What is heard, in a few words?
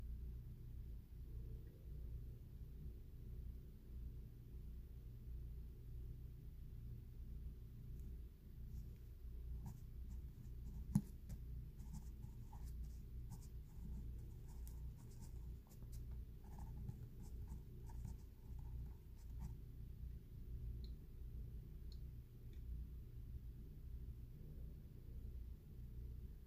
Writing On Paper With A Pen